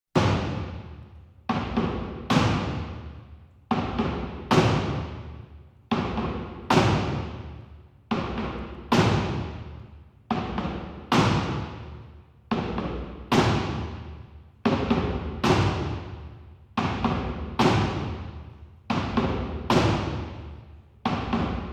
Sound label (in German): Drums Percussion Langsamter Schlagzeugrhytmus